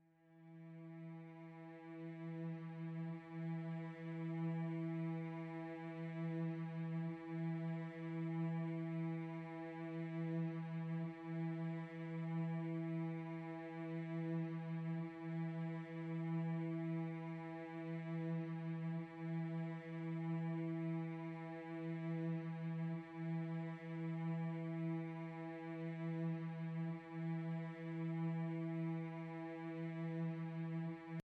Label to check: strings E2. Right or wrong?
Right